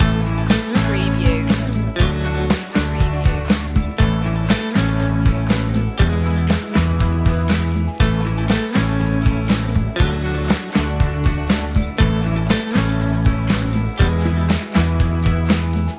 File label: feel good loop